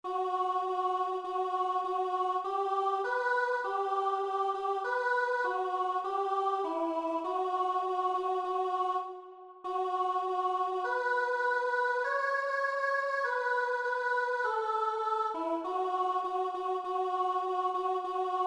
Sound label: Morning Hymne Alt; Audition 2. runde